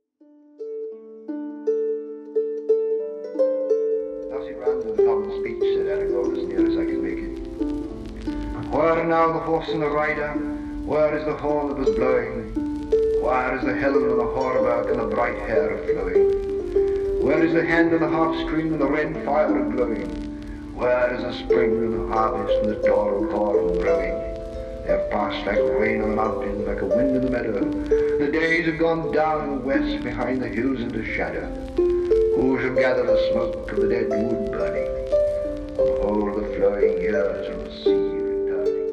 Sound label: Harp; Tolkien Eorl